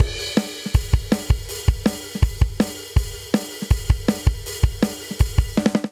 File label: Punk Rock Chorus 162 bpm; 4 4; MIDI Clips; Ableton; Factory Packs; Drum Booth